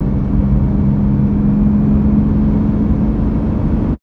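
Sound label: HIGHWAY Engine, Car; VSI Car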